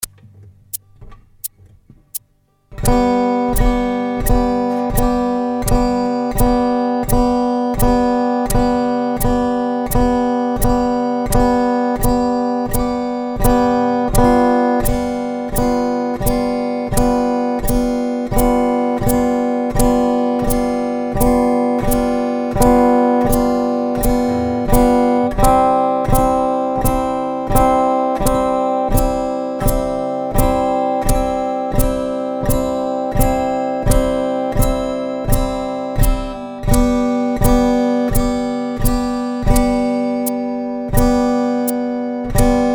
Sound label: Strumming exercises